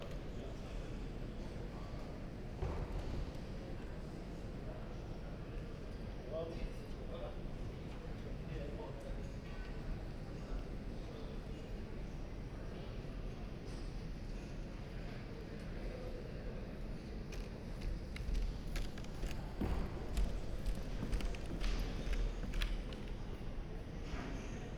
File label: Urban Large Building On Bridge INT Stereo Binaural